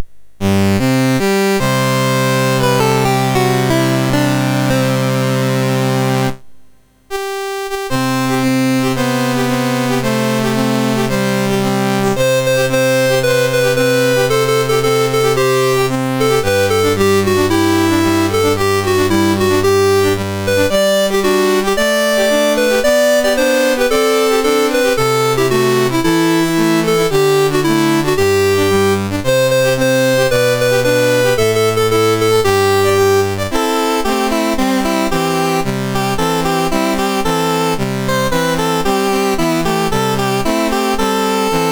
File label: Senegal, National Anthems, MOS 6581; MOS6581R3